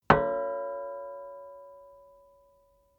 plate hit ring slightly damped; hits impacts

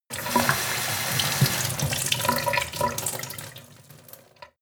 sink water pot medium

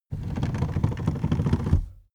car seat slide backward slow rattle

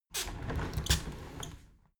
window handle squeak turn open soft; objekte; fenster

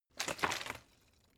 bottle water sparse shake single soft; berufe

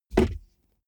car bmw e46 interior compartment close

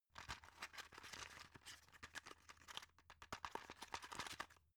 drug open shaky; arzt

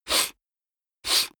nose sniff male short; koerpergeraeusche; menschen; soundpool

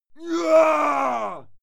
male fight scream pain; schreie; menschen; soundpool

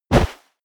step walk snow; schritte

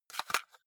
cassette case insert medium; objekte; kassette

